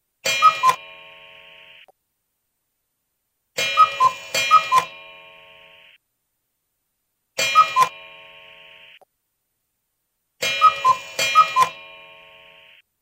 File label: Old Cuckoo